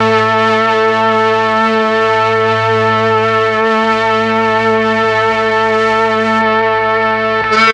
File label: MEL STR, Legendary M400; ILIO; Propeller Island